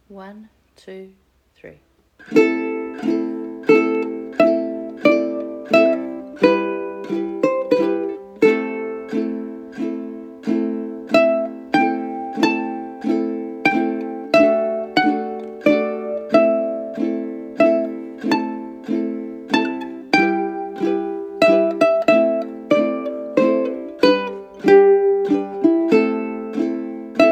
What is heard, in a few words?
Uke; scarborough fair; Uke book